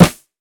Verby Snare E Key, acoustic snare tuned to the E note; snare drum samples; drum single hits one shots